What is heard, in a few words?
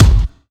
rich top end bass drum G key